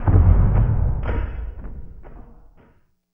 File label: Elektro Brood, Drums; Sonic Foundry (Sony Creative Software); Friendly Fire